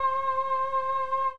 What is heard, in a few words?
Horns